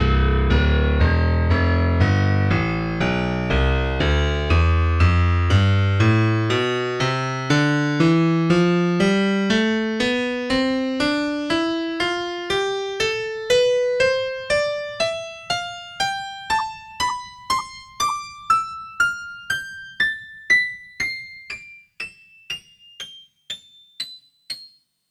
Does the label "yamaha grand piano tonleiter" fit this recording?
Yes